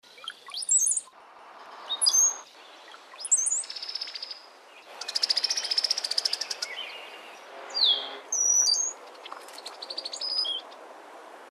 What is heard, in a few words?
COWBIRD BROWN HEADED; species/birds; wbba